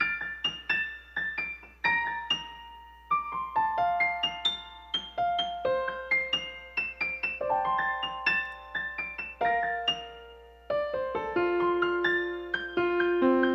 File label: piano